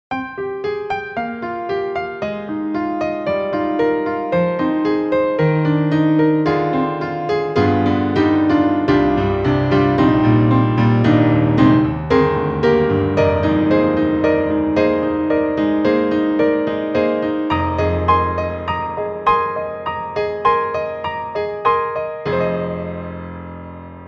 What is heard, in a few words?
Truepianos Emerald Classic; 4Front